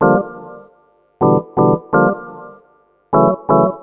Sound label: Organ chops (125BPM), retro house